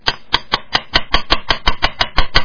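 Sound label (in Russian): стук в дверь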